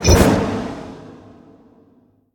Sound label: Melee Large; smite; god bakasura